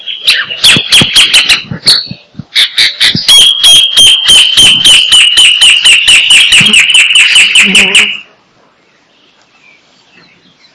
tio tio grande

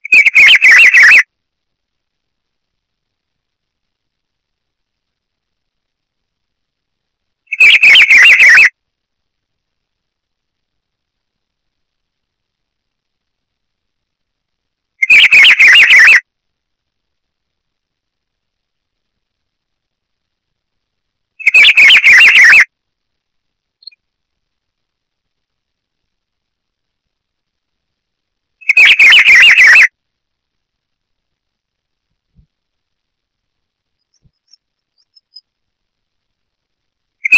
Cyclarhis gujanensis - Juan chiviro

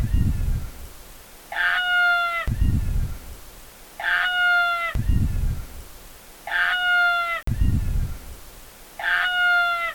Albatros oscuro

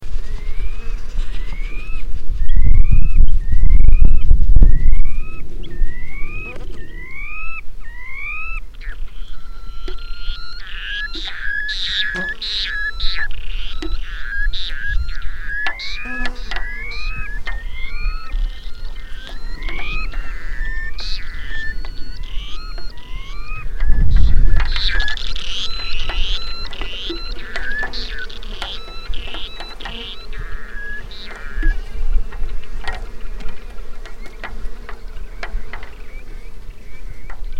playero picudo

